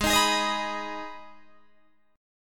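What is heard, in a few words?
Ab Minor chord, Guitar, Open D tuning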